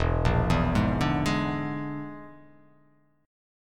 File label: E 7th Flat 5th, E7b5 chord; arpeggiated